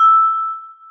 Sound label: celesta, programmable speaker